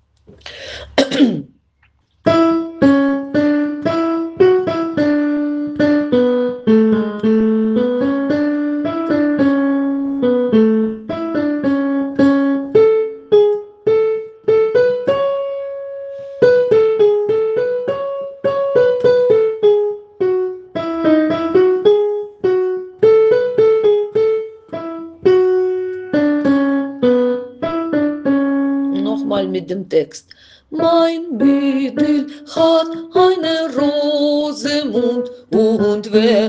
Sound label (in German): Ich wollt gern singen alt